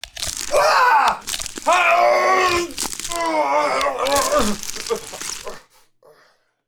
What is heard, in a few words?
Male Being Eaten Death; Project Zomboid v41.73